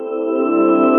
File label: heaven choir sound